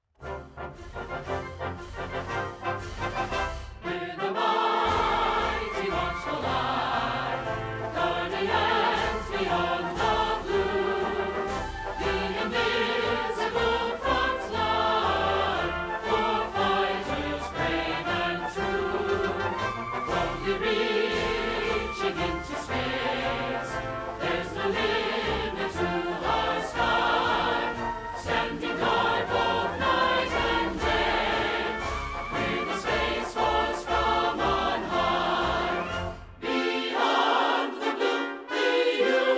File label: Vocal; Space Force Song